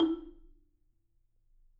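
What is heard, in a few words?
E3, Marimba, dead note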